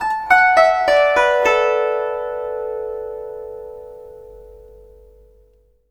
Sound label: GUZHEN; Spectrasonics; Heart of Asia